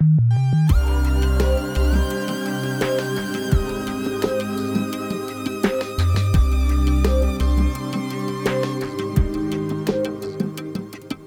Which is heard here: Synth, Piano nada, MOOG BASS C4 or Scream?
Synth